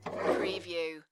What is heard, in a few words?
Pinball sound, ball roll